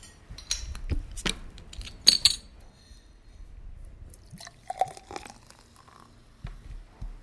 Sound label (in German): Getränk eingießen